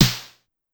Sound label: Snare 909, Drum Machines Clavia DDrum-AT Pitched Down; 300 Drum Machines